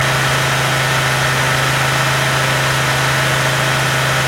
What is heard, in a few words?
Truck Ford F150 Loop RPM 5000 Engine Perspective